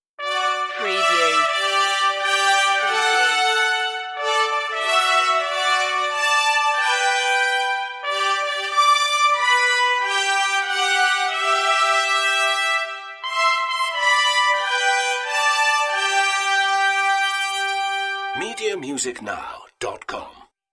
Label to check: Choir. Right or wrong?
Wrong